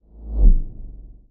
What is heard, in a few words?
whoosh object pass small left